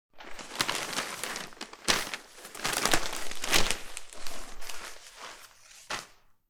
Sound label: Newspaper Rustling; SFXSource; Object & Material Sounds